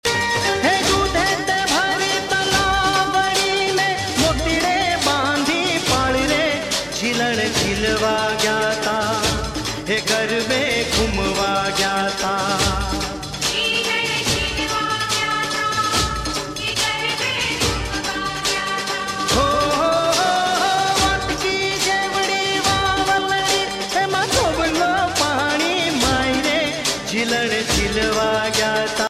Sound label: Gujarati Dandiya